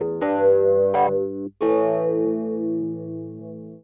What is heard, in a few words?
organ house 125 A; eJay; Keys